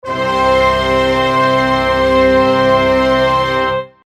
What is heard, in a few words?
correct unison